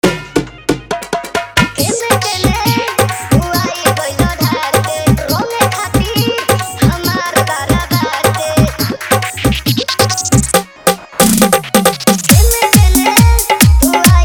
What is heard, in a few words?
Holi Hard Dhollki Bass Mix